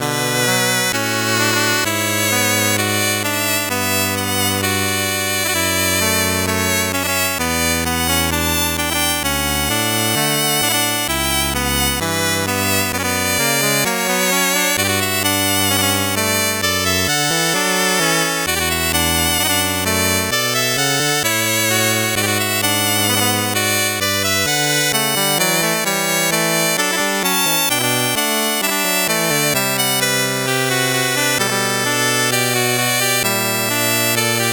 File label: 8bit bgm slow